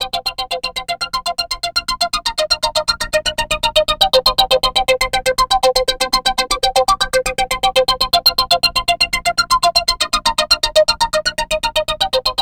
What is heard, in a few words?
Progressive Synth, Trance